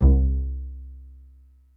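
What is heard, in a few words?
string bass C2, pizz section; Greytsounds VOL-1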